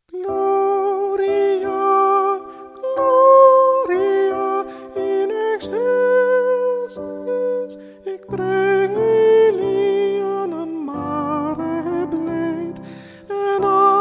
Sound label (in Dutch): engel gloria zang; kinderliederen